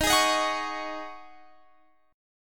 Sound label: Ab 7th Suspended 4th Eb